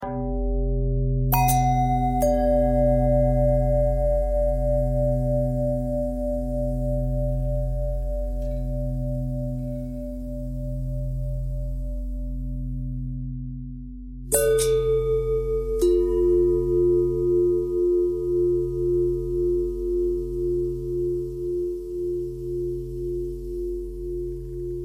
long bell bell thread pentatonic, bells

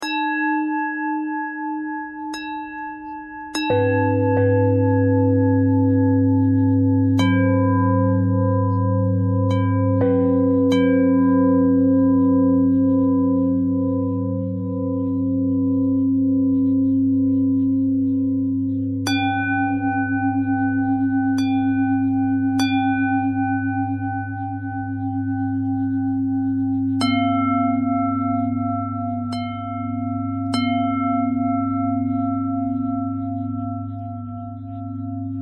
bell threads

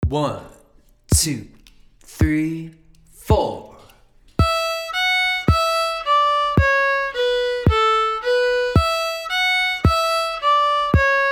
Old Joe Clarke A part Bar 6; fiddle

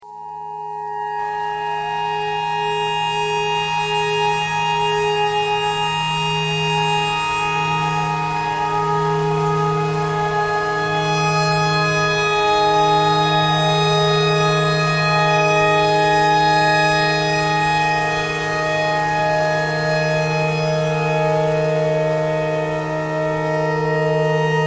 tornado siren clarinet notes e thread pentatonic

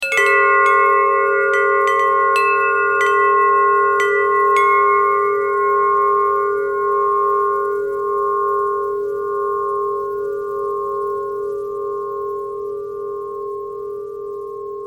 bell thread

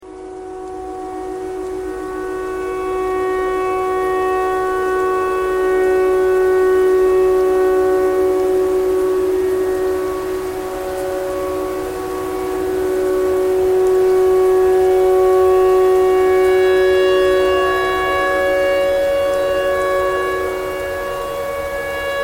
tornado siren tornado siren thread simple

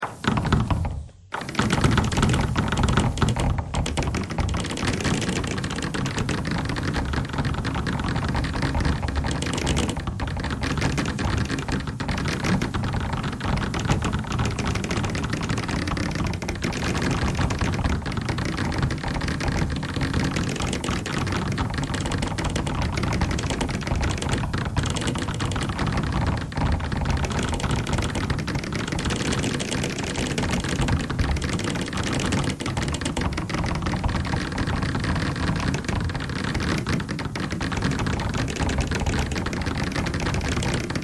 bagpipe noise

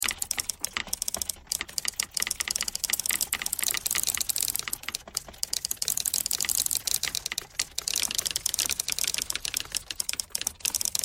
typewriter thread all

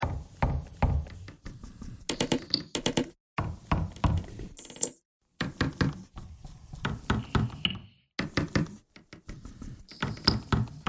bagpipe noise; bagpipeclick

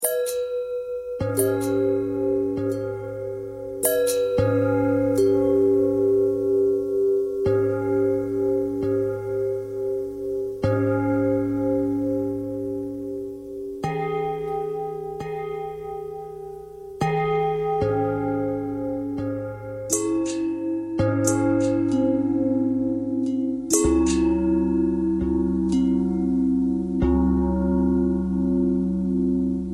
bell long bell thread simple, bells

